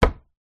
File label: feet jump land wood step hard; foley